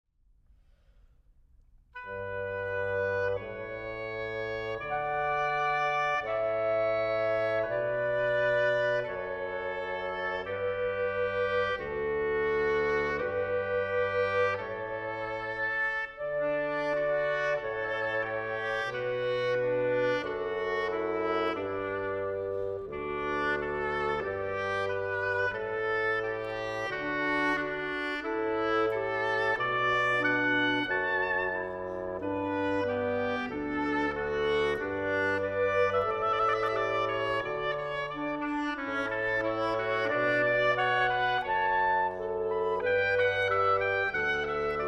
Woodwind Trio

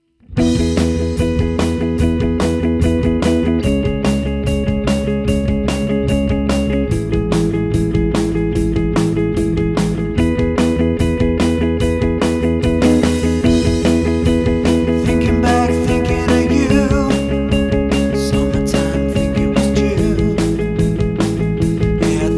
4 Piece Rock/pop Band; M.I.B Rocks

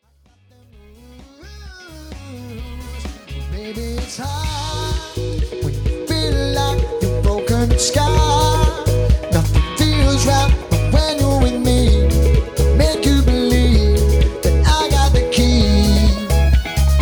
Rock, LIVE

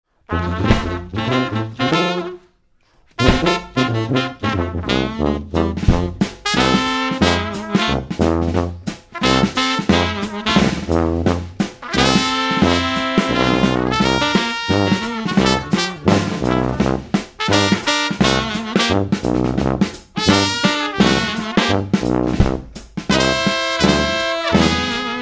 Horns

